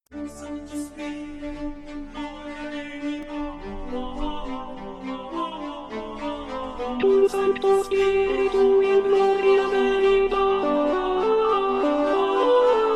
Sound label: Cum sancto spiritu (sop); Stage Zelenka 2023; zelenka zwv21